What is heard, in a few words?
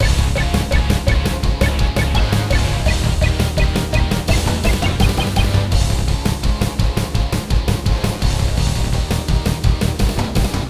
metal; Ace Attorney 6 Phoenix Wright Spirit of Justice